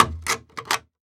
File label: Foley Metal Can Punch Holes In Can